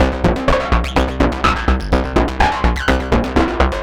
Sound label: synth 125 pounder C Ab G; Transmission-X